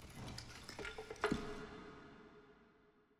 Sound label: ambience room debris; necromastery